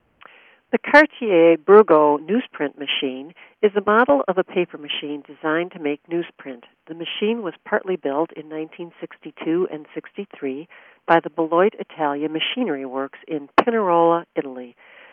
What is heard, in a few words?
newsprint machine; Cartiere Burgo